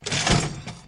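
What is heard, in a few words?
sound of start of rotation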